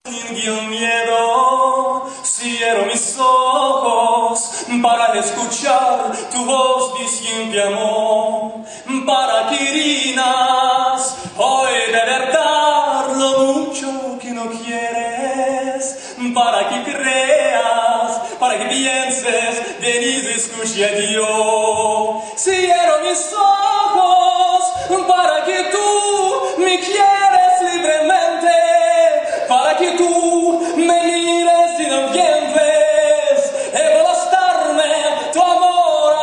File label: Cierro akapello